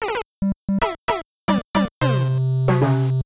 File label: sound effects lost life; super mario bros 3 nes